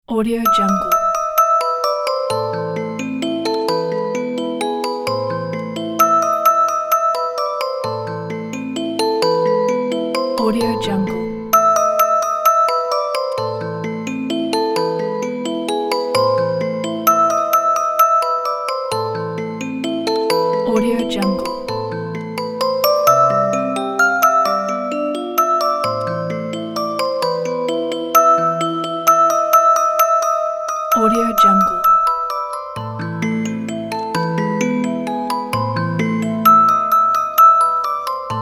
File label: music box